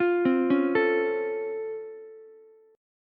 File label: C sharp Augmented Major 7th C sharp down Ukulele, chord; C#+M7; arpeggiated; JGuitar